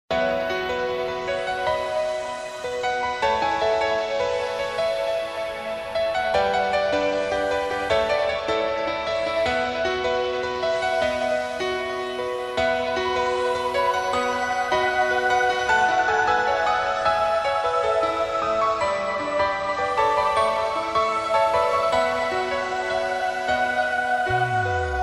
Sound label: music main morning